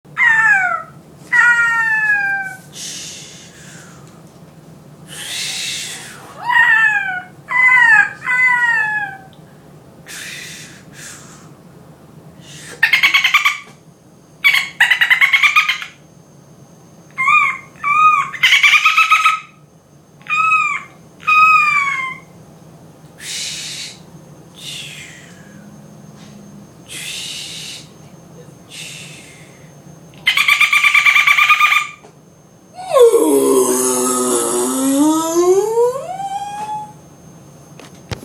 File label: oceanscape